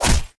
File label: star hit, Weapons